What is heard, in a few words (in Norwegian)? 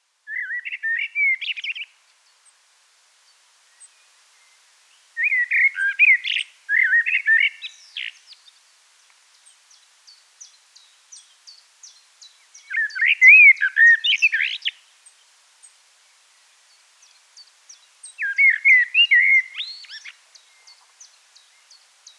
svarttrost turdus merula